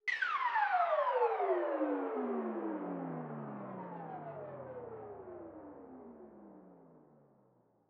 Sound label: percussion, percusson samples; RockScience